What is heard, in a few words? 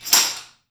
trash stix; Spectrasonics; Liquid Grooves 1 & 2; METALS